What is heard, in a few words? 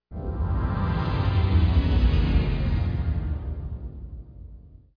SLO MO FLOURISH; Bolt